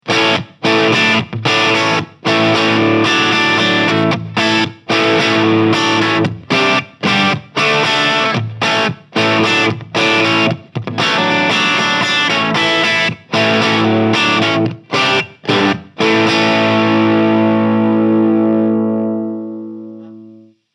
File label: Normal Channel Crunch; Soundcity Master One Hundred